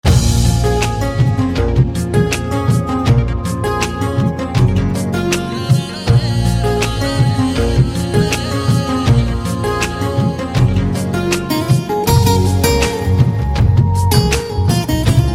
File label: Inst